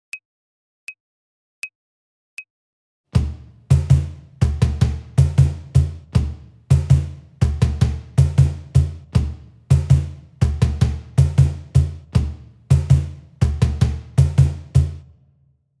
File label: Samba Var